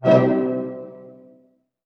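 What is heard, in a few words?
harp hit F# major; Sound On Sound; Peter Siedleczek's Orchestra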